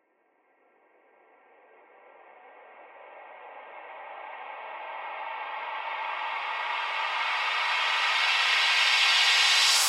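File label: whoosh in space intensifying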